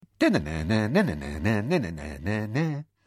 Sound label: sings the ringtone; stephen fry; nokia